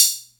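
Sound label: Atlantex Music Percussion Computer hi-hat closed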